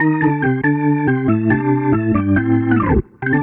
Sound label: Jazz Keys Organ 140 bpm Riff A